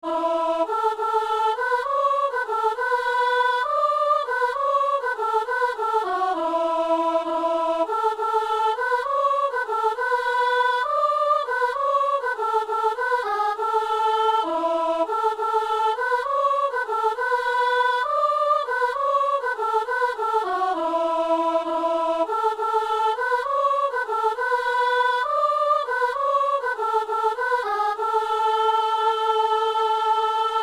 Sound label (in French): louez dieu tous les peuples alleluia irlandais soprano